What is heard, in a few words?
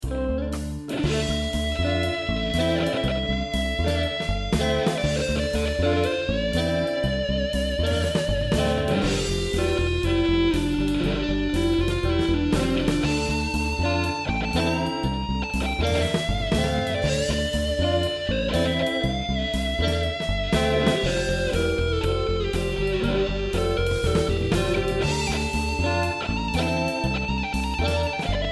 solo